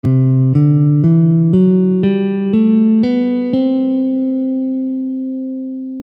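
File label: Lydian C, Gammes & Modes · Guitar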